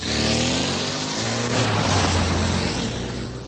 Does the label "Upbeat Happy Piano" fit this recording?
No